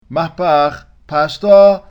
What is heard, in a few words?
mapach pashta, cantilation